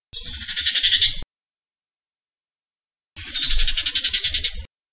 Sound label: omao; Saddle Road Birding